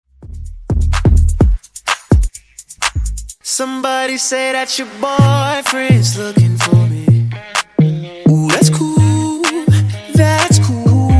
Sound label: Funk Intro Outro Clean 127Bpm